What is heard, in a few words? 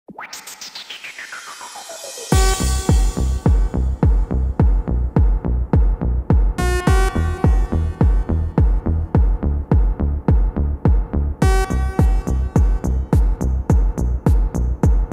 639Hz slowed reverb